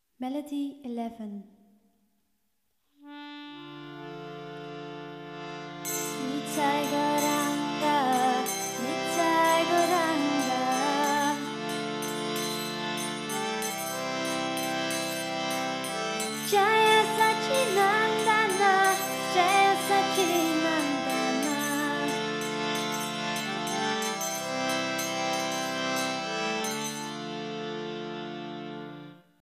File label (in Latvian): hare krishna melodija, easy harmonium; sankirtan; badzan